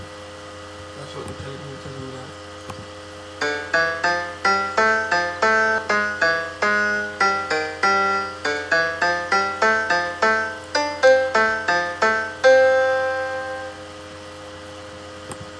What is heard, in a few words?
mac keyboard piano sorry for the quality; what's that tune